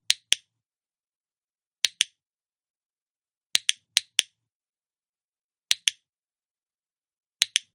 dog clicker

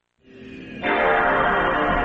startup; ps2

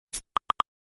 knock brush notification; slack

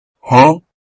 huh cat

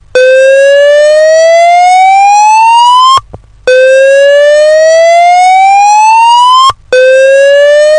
slow whoop brandalarm

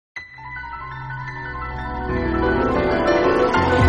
creepy piano; from Walking Guardian fight theme

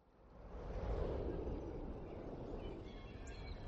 noise test music in adjacent room

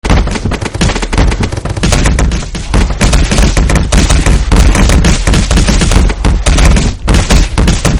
gmod ragdoll impact sounds

